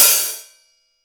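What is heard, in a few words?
HI-HAT CLOSED; Greytsounds; HI-HATS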